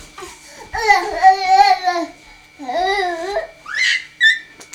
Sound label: mo babble sqeal shorter